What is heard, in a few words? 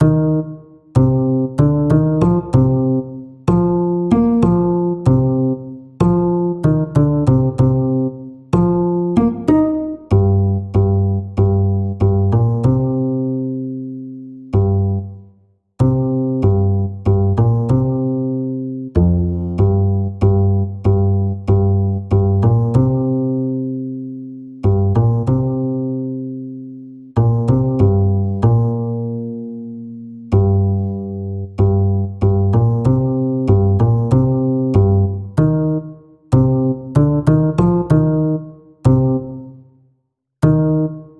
Bass